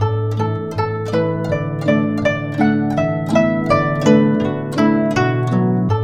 GUCHIN 80 D; INIS - Opium

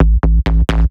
bass porcelain kit; Polyend; Recue

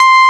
JV 101 BASS, JV-1080; Syntec; Wall of Sounds

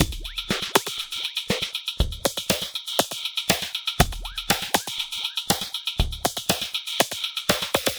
philter beat; Sonic Foundry (Sony Creative Software); Ugly Remnants VOL-2

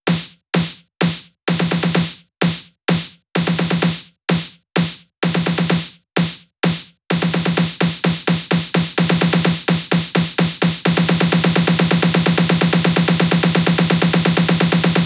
Drum Loop snare Uplifter Snare Filter, 128BPM; VEC5 Drumloops; VEC5 Multi Loops 128BPM; Loop Elements

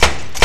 punch, metal & wood; Roland - LA Composer vol. 1

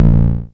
Klone Dual-Percussion-Synthesiser, DRUMHITS; KLoNe MONO; 300 Drum Machines

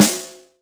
snare, Roland R-70

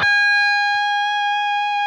PRS lead

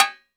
KOEAGKARI, KOREAN PERCUSSION; AKAI S6000 CD-ROM - Volume 5

